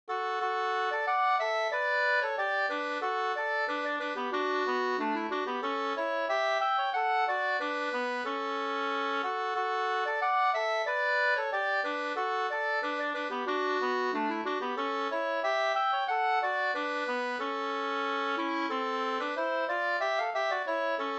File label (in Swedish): vismelodi; Sparv Fars visa